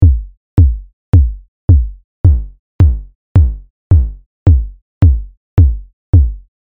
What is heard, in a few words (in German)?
Kick Oszillator Sinus Rechteck Hybrid